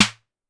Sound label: Snare Electronic Hi, Drum Machines Clavia DDrum-AT Pitched Down; 300 Drum Machines